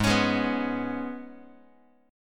Ab Minor Double Flat 5th Ab, Guitar; strummed; 4A,4E,5A,5D,5G#,6C#; JGuitar

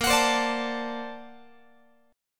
Bb Augmented Major 9th Bb, Guitar; Bb+M9 strummed; JGuitar

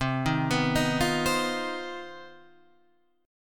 C Minor Major 7th Double Flat 5th C; CmM7bb5 Chord {8 6 9 8 6 8} arpeggiated

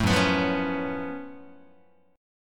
Gb Minor Major 11th Gb Guitar; strummed; JGuitar

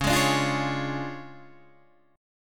D Minor Major 7th Flat 5th, Db

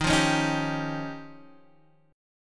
F 7th Suspended 2nd Sharp 5th

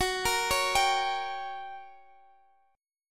G Minor Major 7th Flat 5th, Gb

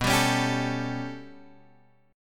B 7th Suspended 2nd Sharp 5th, chord; B7sus2#5 Chord {7 X 5 6 8 5} strummed

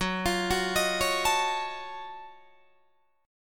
F sharp Minor Major 13th, Guitar DADGAD

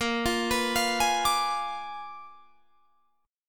A sharp 7th Flat 9th A sharp down Guitar Drop D

